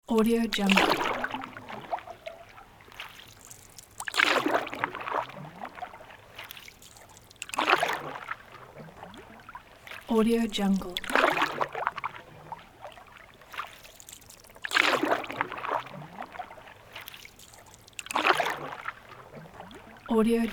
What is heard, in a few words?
light paddling in canoe; sound-ideas